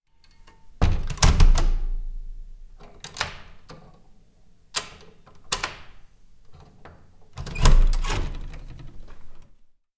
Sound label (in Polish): Drzwi Zamykanie, door closing